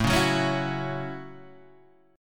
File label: A 6th, Guitar, DADGAD